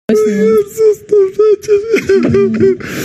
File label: cry